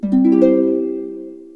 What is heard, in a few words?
Harp; Lottery Wizard 2.0